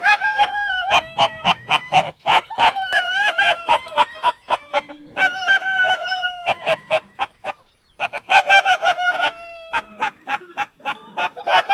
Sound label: two nigerians, laughter